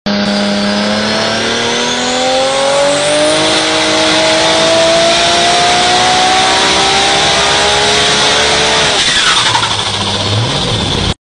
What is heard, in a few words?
Turbo sound